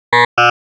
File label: shepard